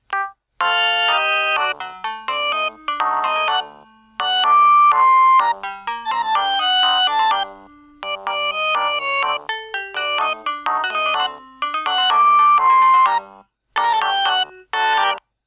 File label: Siemens A52 tango